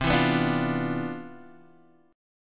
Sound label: C Major 11th C, Guitar Open G